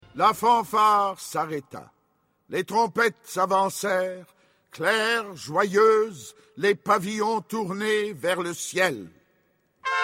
piccolo saxo trompettes

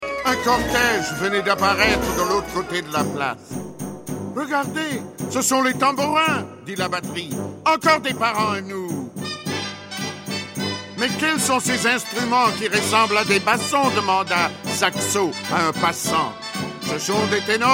espagne tambourin tenoras; passeport piccolo

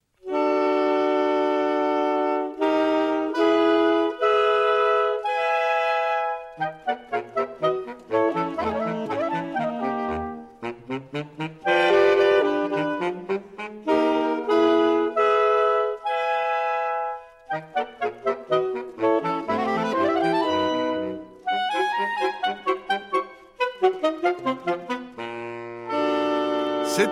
saxos; piccolo saxo

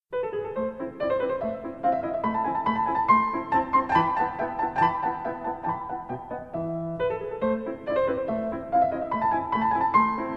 classique marche turque; mozart

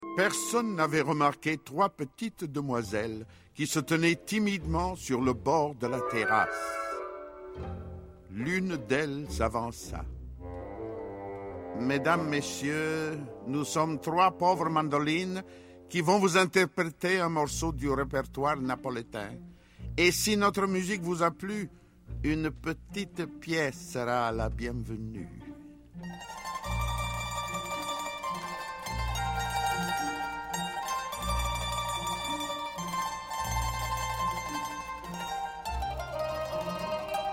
mandolines; passeport piccolo; italie

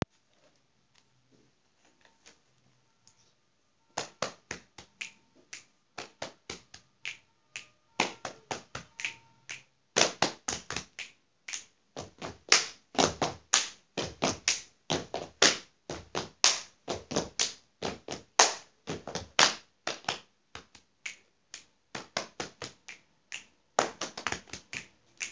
percussions corporelles par petits groupes; travail de creation

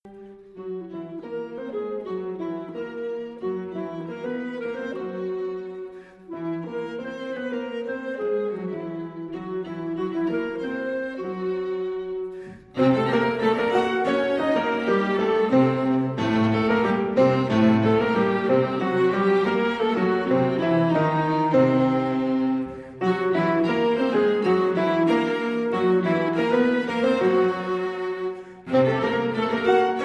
Quatuor

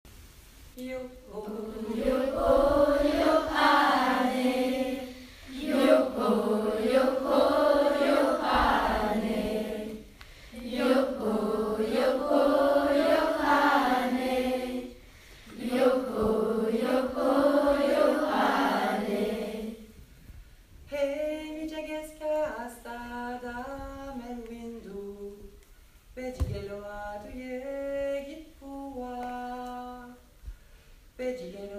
chant amerindien

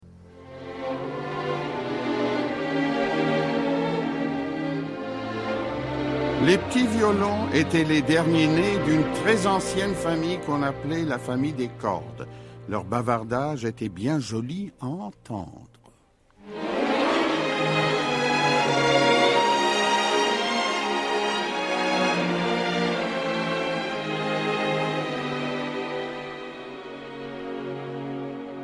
cordes; piccolo saxo

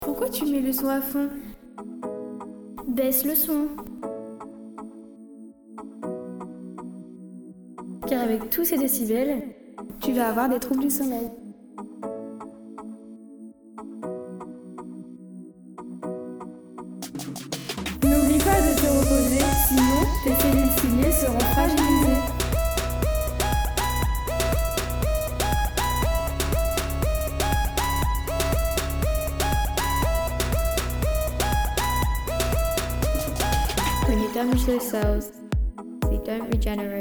version instrumentale

